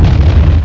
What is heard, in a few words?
EXPLOS